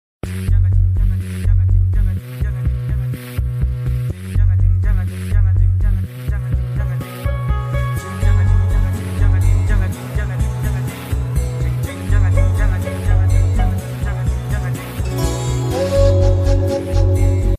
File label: instrumental reprise version ringtone